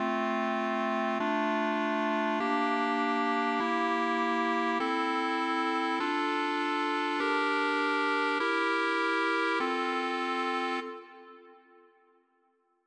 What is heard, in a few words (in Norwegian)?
klarinettversjon lavere